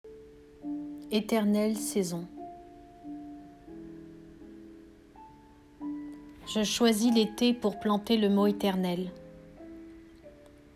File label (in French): musique